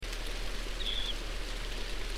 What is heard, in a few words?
mystery call